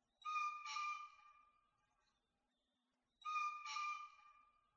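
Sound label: kiji call, green pheasant; nakigoe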